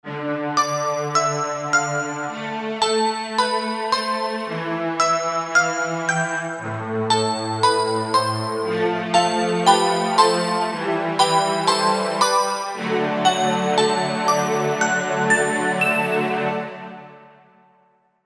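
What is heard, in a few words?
Korg MSC-1S Strings PF Bell; Korg MSC-1S Variety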